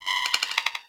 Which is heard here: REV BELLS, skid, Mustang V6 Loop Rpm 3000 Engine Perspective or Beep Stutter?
Beep Stutter